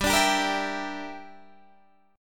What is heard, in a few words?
G Minor 6th, Guitar Standard; Gm6 strummed; JGuitar